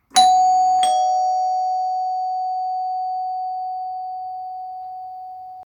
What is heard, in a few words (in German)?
klingel